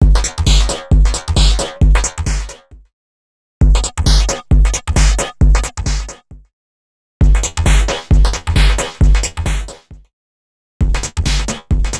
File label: Housedrive 133.5bpm; X-Static Goldmine 5